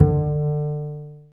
STR, STRINGS; Roland - LA Composer vol. 1

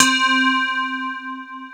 JD990

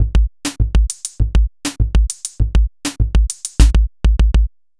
Jerky Beat, Electronic Beats; Sonic Foundry (Sony Creative Software); Myths of Technology

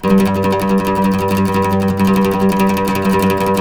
TREMOLO, Turkish Oud

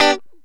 Lounge Guitar Chick Major G2; Zero-G Kocktail Kollection

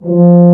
Tuba mf F2; Digital Sound Factory Virtuoso

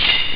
ring; Amiga Soundtracker Sample Packs

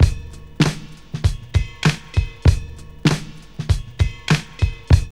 PARTY BELL 98, Funky Elements LOOPS; Zero-G

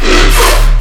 BASS SHOT

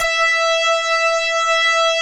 METAL BR

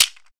PRC WOLOF, Afro Shakers

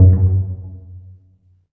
PIZZ, Dance Mega Synth

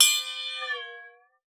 WATER, PERC; Xsample VOL-9

